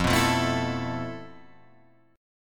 F Major 7th Flat 5th, F, down, Guitar, Drop D

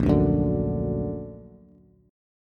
Bb Augmented Bb, Bass; strummed; JGuitar

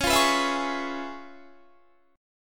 Db 7th Sharp 9th Db, down, Guitar; strummed; 4E,4A,5D,5G,5C,6F; JGuitar

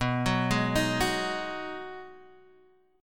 B 6th B down Guitar Standard; B6 arpeggiated

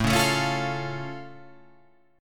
A Augmented A down

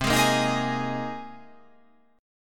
C Minor 7th Sharp 5th; down; chord shape

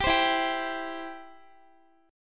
E Minor Sharp 5th, Guitar, 4E,4A,5D,5G,5C,6F; strummed; Em#5; x,x,17,17,16,14

